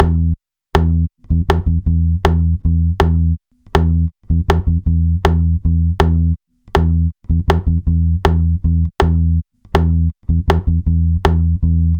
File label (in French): Rythme 80 bpm